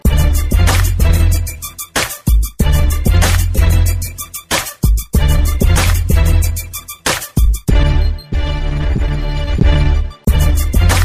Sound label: Hiphop